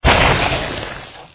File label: skelet attack low